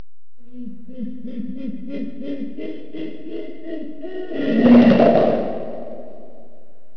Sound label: GORRILLA